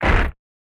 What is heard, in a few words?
body impact ground